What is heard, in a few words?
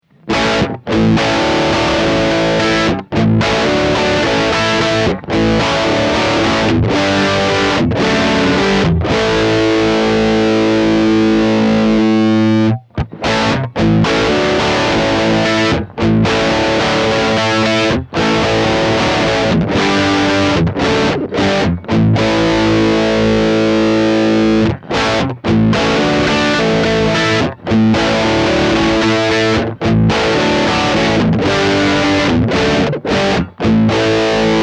Tech 21 SansAmp PSA 2.0 Marshall